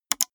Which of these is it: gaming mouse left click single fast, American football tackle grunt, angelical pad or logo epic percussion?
gaming mouse left click single fast